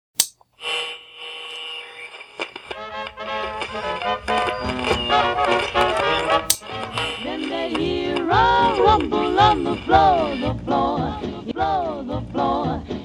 ghost radio floor song; winchester

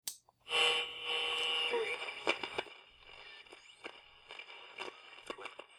ghost radio tuning; winchester

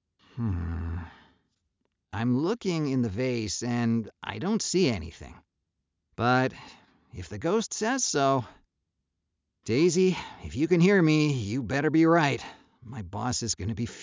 breaking vase; taylor; winchester; century ghost

